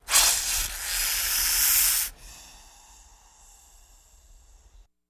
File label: Snake Hiss, Breath